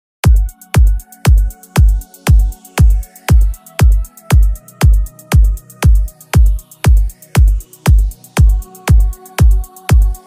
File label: italo disco instr cover